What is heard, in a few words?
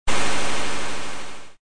beam shot